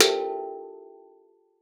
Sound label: SD FX; Xsample VOL-4